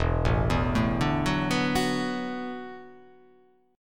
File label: E 7th Suspended 2nd Suspended 4th, down